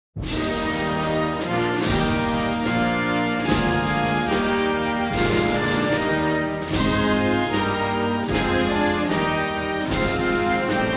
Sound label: Germany - Deutschlandlied, Orchestra; The National Anthems